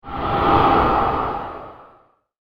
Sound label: Gateway Of Death, evil sound effect; Evil Sound Effects; fantasy sound effect; Andrew Fleming